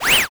swipe hard

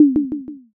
tsuzumi

